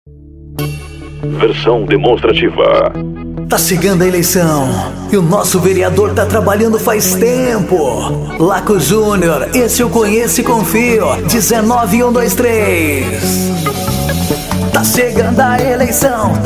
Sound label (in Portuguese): jingle pisadinha forró parodia; meme do caixao; para prefeito e vereador; eleicoes